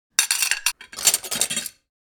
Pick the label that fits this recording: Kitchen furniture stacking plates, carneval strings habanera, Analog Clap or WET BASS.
Kitchen furniture stacking plates